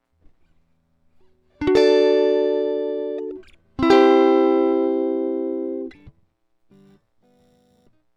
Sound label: e. gtr